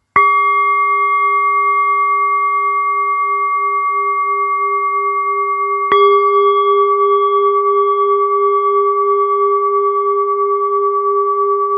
chopa, tibetska misa; tibetska misa choepa 480 g

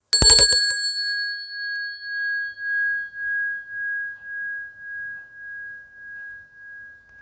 zvonek stredni, tibetske zvoncek velky

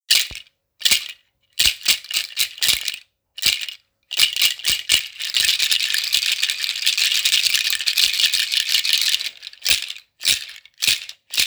chrastitko tobillera

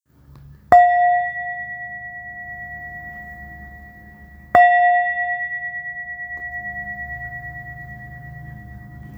tibetská zdobená misa 474g

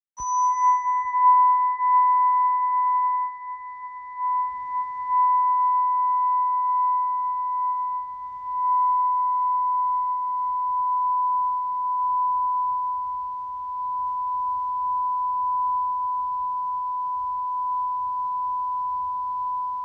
999 hz, terapeuticka ladicka hlinik energia 999 hz

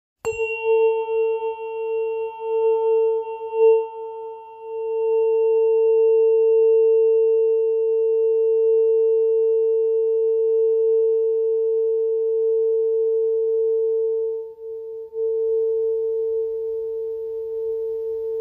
448 hz, terapeuticka ladicka 448 hz hlinik kremik

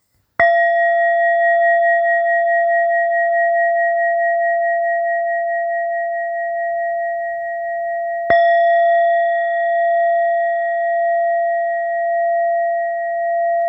321 ish, tibetska misa ishana black 321 g

